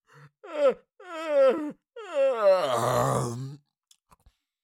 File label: Cartoon lion voice yawn